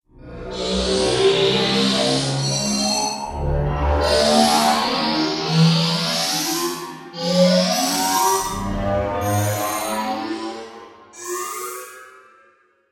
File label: Epic Whoosh A Transition; Andrew Fleming